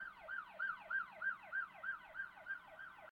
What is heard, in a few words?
Amb Generic; Dirt 4